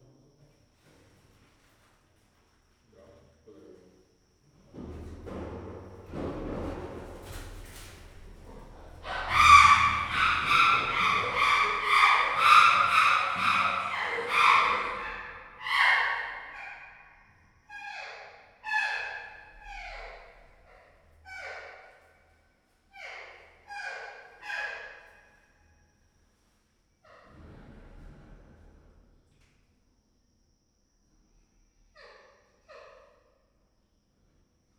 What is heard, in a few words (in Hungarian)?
hangoskodó anyaállat, csimpánz; gyori zoo 2014